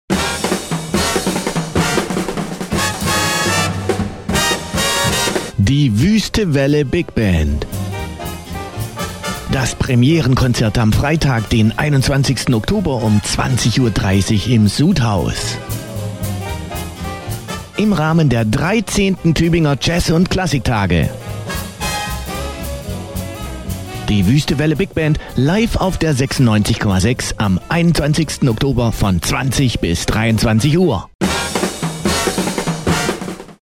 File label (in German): BIGBAND Jingle